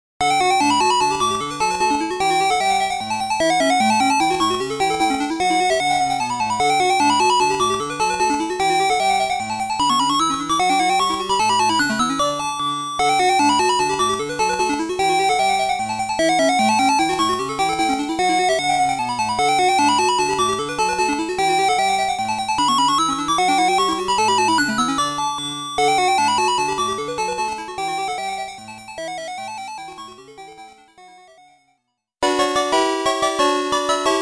Bonus Stage BGM Bonus Stage Clear Bonus Stage Failed Miss Game Over, arcade; Sega Arcade 80s Vol. 1